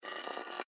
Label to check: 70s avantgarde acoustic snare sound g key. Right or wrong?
Wrong